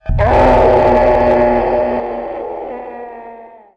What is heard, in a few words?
Phantom Die